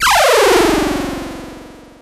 power-down

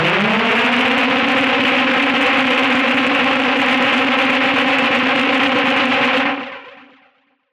bike

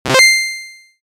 menu select mechanic; operation

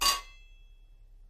metal hit

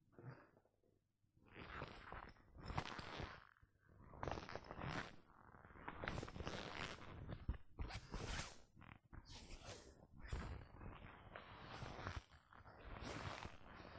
rub the nylon; operation

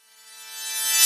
wipe

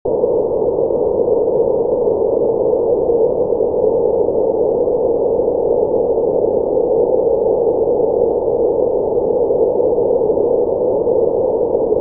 wind

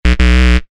quiz wrong

